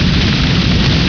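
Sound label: flamethrower; TNP demo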